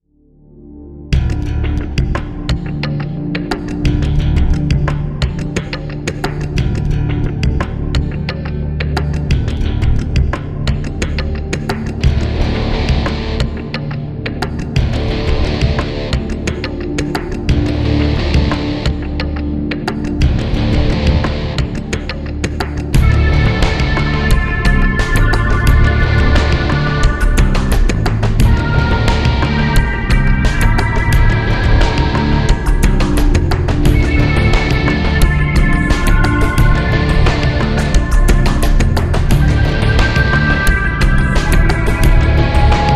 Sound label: forest music; Richard Garriott's Tabula Rasa